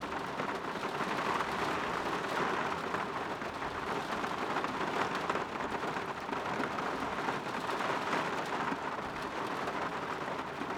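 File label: Rain, Interior Car, Pouring, Backseat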